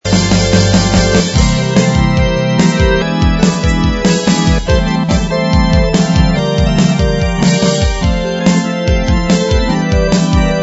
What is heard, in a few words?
little ditty